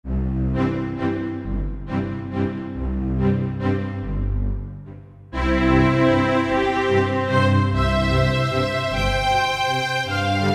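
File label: Strings Section; Roland SC-880; Factory Patches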